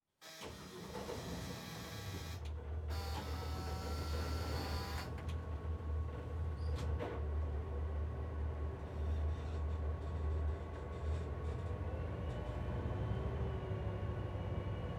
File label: Emergency Patrol Boat Drive In Reverse; emergency effects; AdobeCC